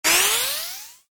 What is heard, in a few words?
Generic Bonus Or Pickup, video game; Video Games; Stuart Duffield